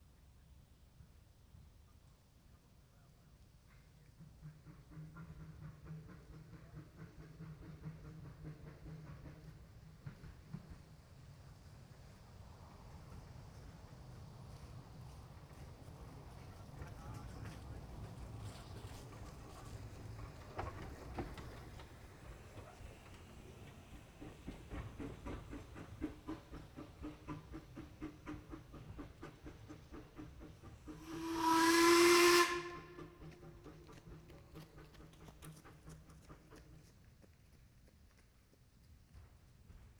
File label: Vehicles Steam Train Passing By Stereo Binaural